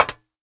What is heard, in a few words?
metal, footsteps; 1000fx